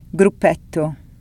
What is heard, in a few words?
turn gruppetto